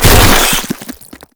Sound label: Maggot Pain; Enemies; Vocals; Warframe